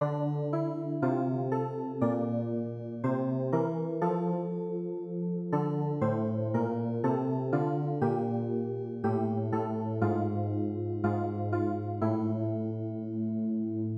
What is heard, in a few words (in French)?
CLAVIER; PSAUME 31